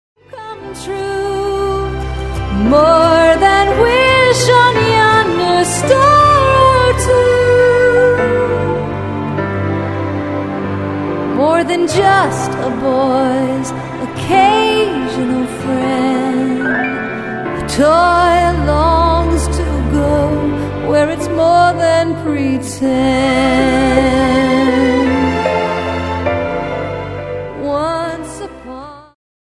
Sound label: sing along, waltz; toy story; woodys